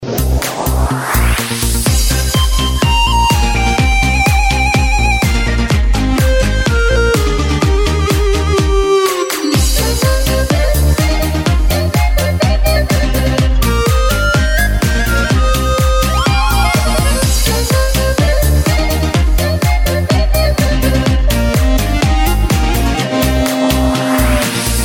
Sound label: balkan hit